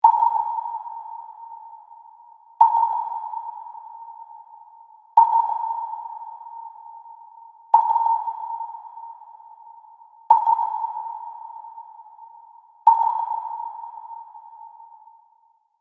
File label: Submarine sonar pinging scan echo